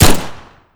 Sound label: pistol fire